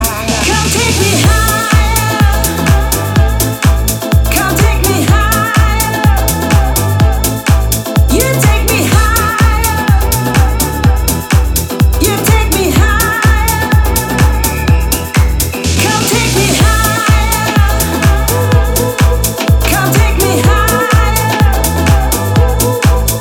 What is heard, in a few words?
Latin House Summer Mix